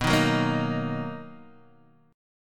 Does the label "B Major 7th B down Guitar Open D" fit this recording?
Yes